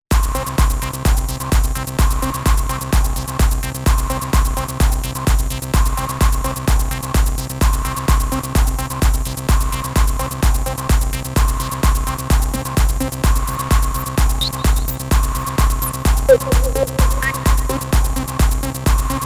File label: EDM Kids